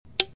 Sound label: click